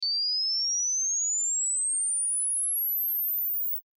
Sci Fi Device Electronic Warm Up High Pitch; Control Panel

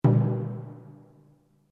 Drum Taiko, Japanese Taiko Drum; Musical; Taikoc Mf; Miscellaneous Musical

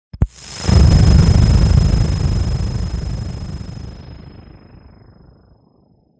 Long Zap; Zaps

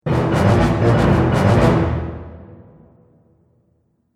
Action Film Dramatic Event, musical

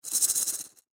Piggy Bank Rattle Shake Fast; Money (Sound Effects:Objects); Blastwave; Foley

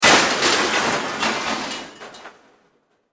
Impact Crash Metal Glass; Metal Sound Effects; Metal (Sound Effects:Materials); SFX Bible 6

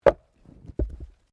Wood Log Place; Material components for constructing more complex sounds; Materials

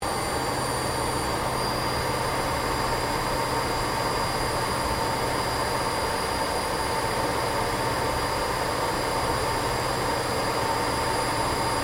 AIRCRAFT CA-27 SABRE FLYING LONG INTERIOR; CA-27/F-86 Sabre; Airplanes (Sound Effects:Vehicles)

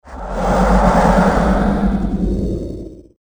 Smoking Dragon, monster sound effect; fantasy

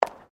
FOOTSTEPS WOMENS HIGH HEELS PAVERS STEP; Footstep Foley; Human

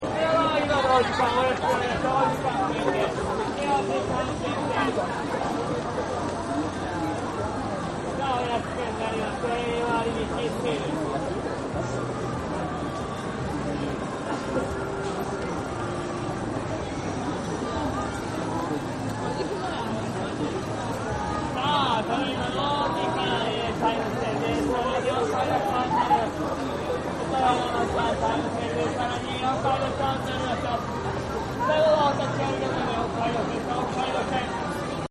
Street Ambience Tokyo; Cities; Backgrounds

